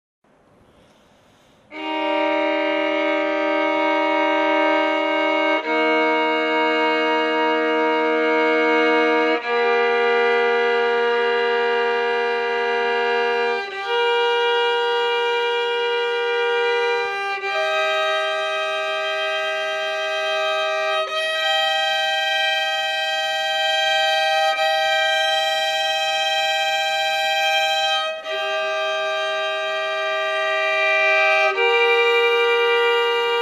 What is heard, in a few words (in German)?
doublestop Es dur